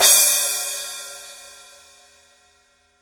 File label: 12 splash edge; signature